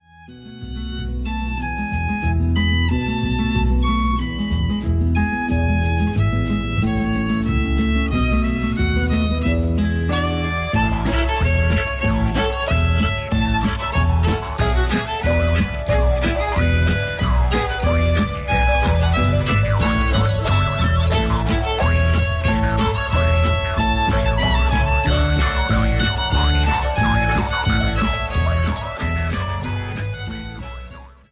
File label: Polka